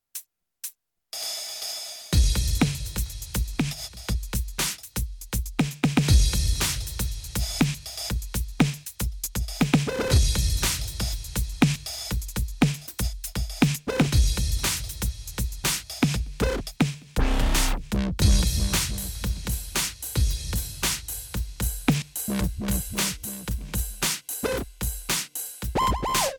PEARL eMERGE Electronic Dub

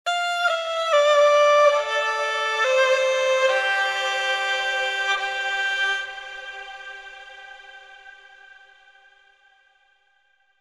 Gaohu Sustains Legato Reverb; Phoenix Orchestra; Valhalla

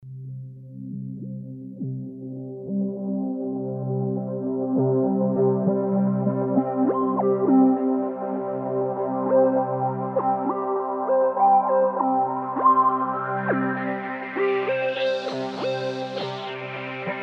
Teenage Engineering TX 6 Filter

